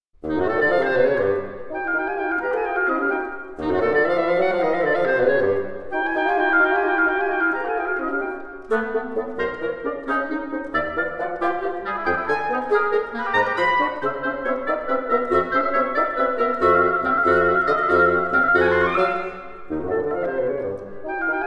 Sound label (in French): Musique pour vents, Impromptu; Hetu; Quatre miniatures III